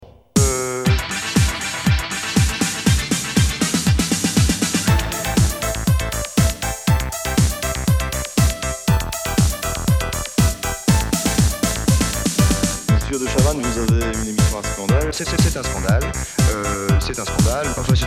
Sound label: Bassline